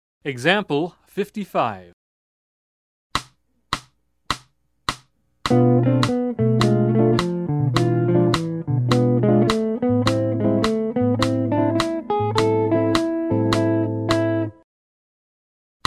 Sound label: Jazz; jue shi ji ta